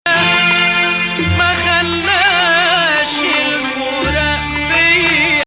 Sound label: Bayati Ajam - Nahawand